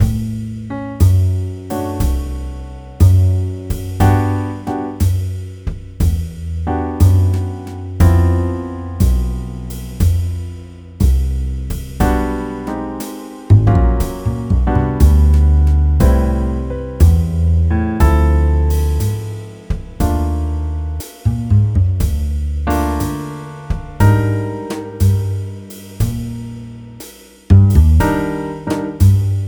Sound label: Bass